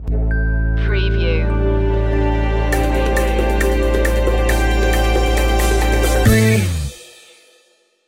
afro disco funk stinger

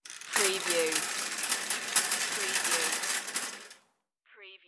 shopping cart

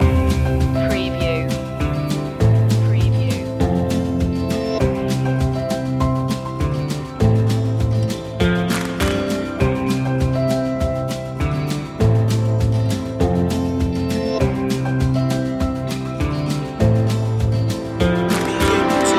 walk together loop

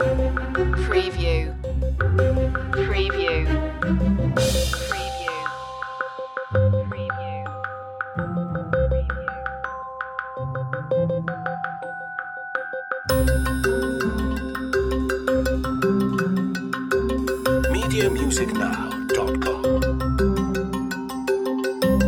quirky creatures loop

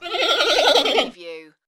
creature talk

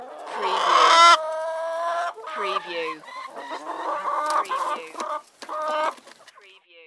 Chickens Squawk

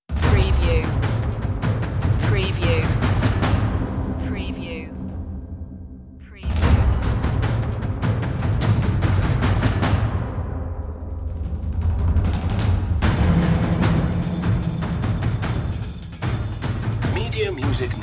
percussion only version

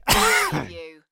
Male Cough; beanstalk audio

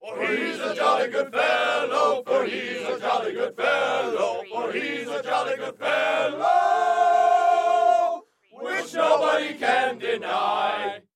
Crowd Sing Jolly Fellow

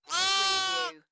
Lamb bleating; beanstalk audio

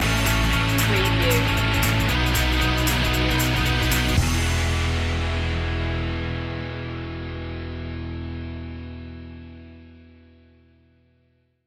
rock me indie stinger

